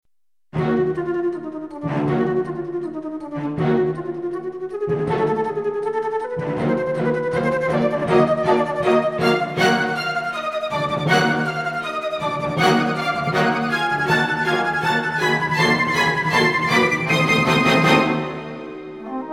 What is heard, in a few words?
alto, Flute; Alto Flute Demo 2